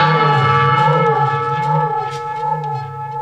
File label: Void Perc; The Void; Processed Drumkits